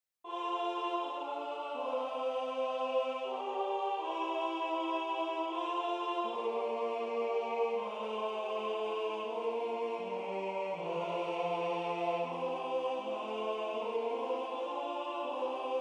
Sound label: Haute Contre; Revecy venir du printans Teil 2